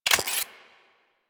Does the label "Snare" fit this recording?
No